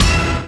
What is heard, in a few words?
Dance Stab EMaj; Proteus 2000; Digital Sound Factory Proteus 2000